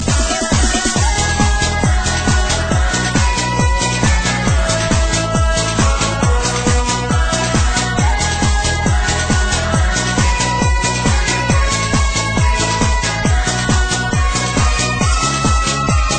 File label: remix theme, electronic ringtones